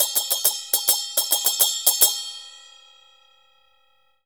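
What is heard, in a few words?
CHINA SM14 R, China cymbal; Spectrasonics - Heart of Asia CD2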